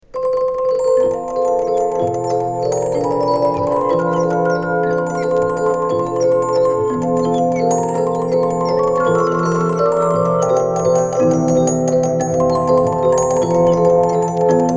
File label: Organ with and without sourdine